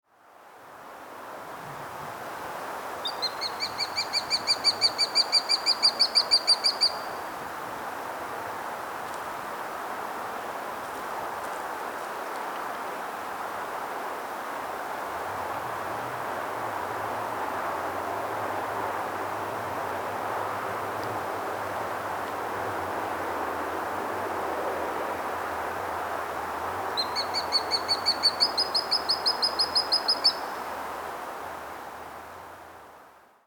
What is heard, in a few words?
Tachyspiza Cirrocephala; Collared Sparrowhawk; Call